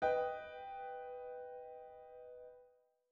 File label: B aug triad